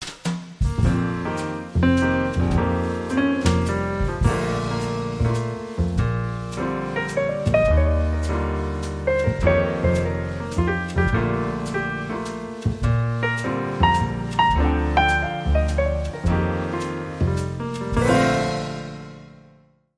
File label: Twinkle Twinkle Little Star